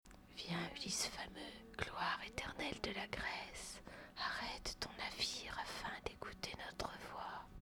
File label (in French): sirene murmure; ulysse; sirenes